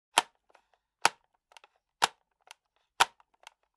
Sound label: Generic Button Plastic Small